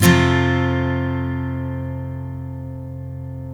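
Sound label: steel F major up, CHORDS STEEL, Guitars; Spectrasonics; Hans Zimmer Guitars VOL-1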